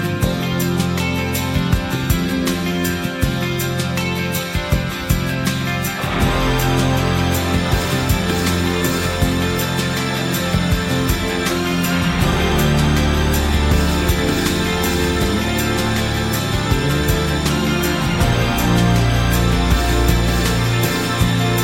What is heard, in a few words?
(instrumental)